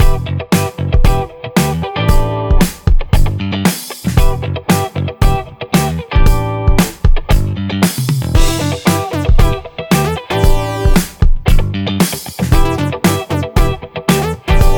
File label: Music Funk; Turbo Dismount